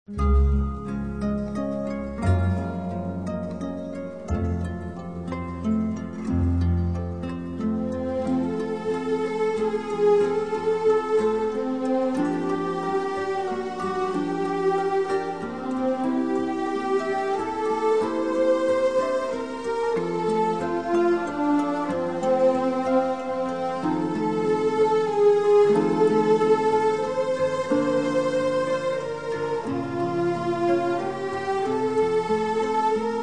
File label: Orchestra, Strings; Majesty Orchestra